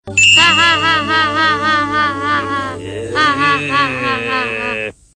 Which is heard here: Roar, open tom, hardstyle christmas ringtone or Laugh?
Laugh